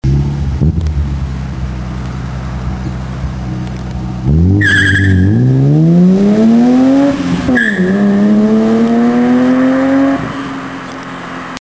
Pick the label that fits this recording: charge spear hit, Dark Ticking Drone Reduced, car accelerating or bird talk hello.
car accelerating